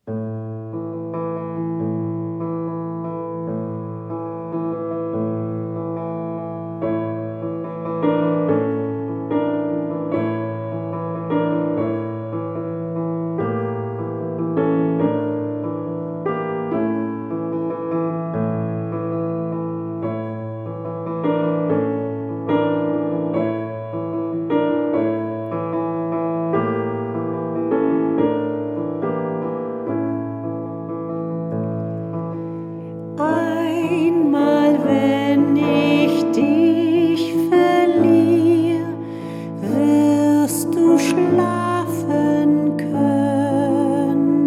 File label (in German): Schlaflied